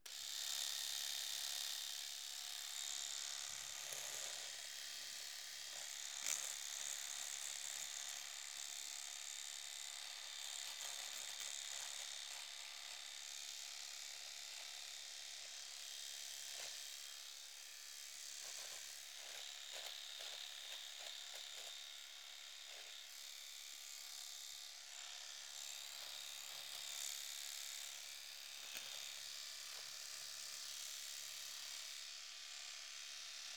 Razor, Electric, Norelco, Trimmer, On, Trim Beard, Off; UberDuo; The Bathroom Audio Playset